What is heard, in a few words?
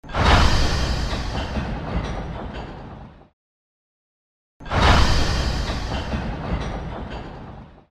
Metal shelf